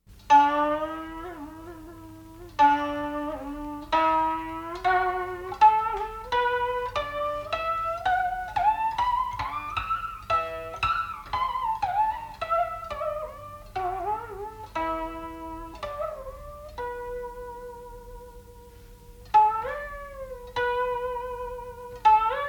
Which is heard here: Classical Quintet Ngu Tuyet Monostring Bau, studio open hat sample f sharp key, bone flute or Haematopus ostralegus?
Classical Quintet Ngu Tuyet Monostring Bau